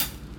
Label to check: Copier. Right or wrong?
Wrong